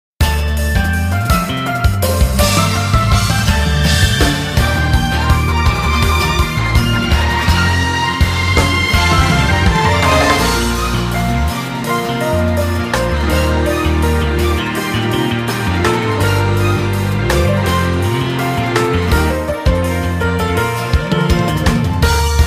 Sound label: Battle BGM